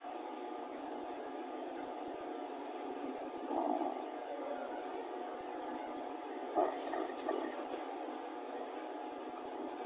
weird laughing

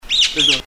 Large Cuckoo Shrike

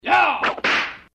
yaa whip

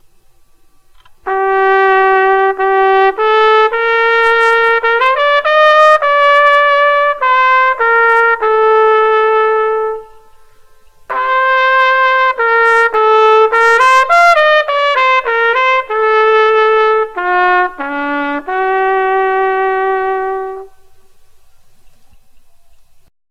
trumpet

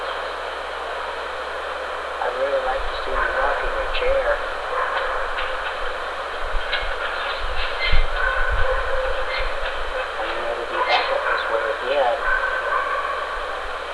creak

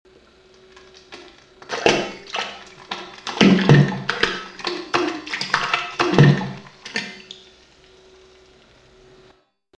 quick plop

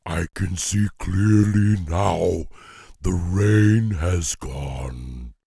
Singing; Warcraft

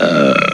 mouth gas

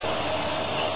chirp; Paranormal EVP

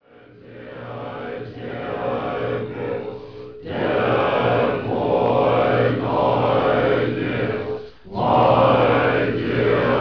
tibet chant

